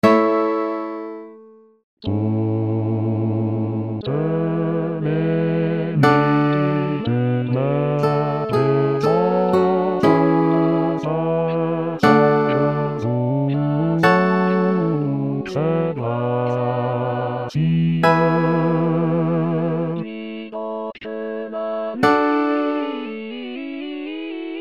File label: toutes les nuits basse